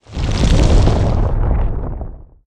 split Reels; oceans treasure

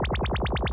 Win Count Up Loop; magic maid cafe

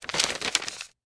map Fold; rage of the seas

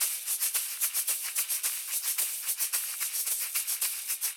cash stack shaker; vegas night life

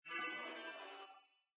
win Flash; cashomatic

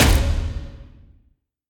start Free Spin Down; jumanji

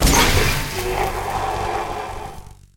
win Medium; wildotron 3000

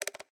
menu Close; jacks or better multiple hand

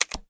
paytable Button; jacks or better multiple hand

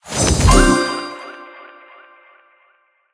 pick n click; pork knox

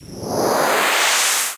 heartbeat; disco danny

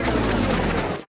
nearwin Fail; trollpot

